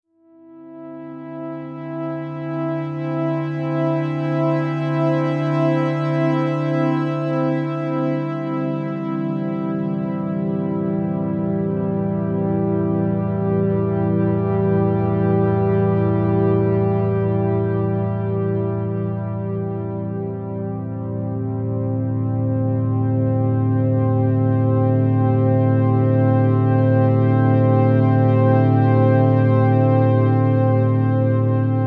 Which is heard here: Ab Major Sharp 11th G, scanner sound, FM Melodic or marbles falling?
FM Melodic